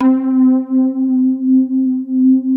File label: MARIMLOG, JV PADS; Pro-Rec - Synthsonic